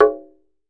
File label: TUNG HI, Tongue Drums; E-MU Producer Series Vol. 7 – Old World Instruments